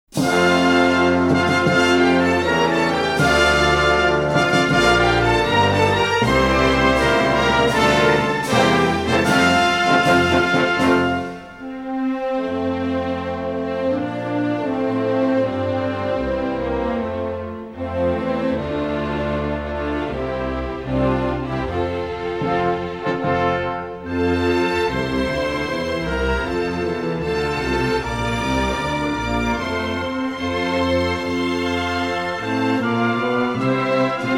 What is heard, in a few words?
song karaoke; rikkyo